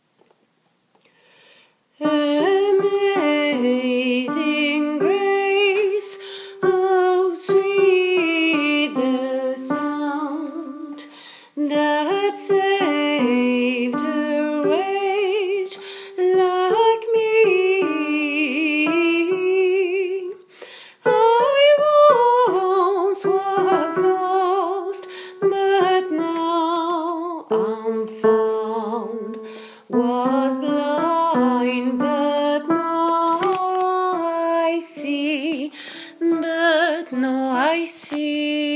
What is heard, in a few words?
amazin grâce alto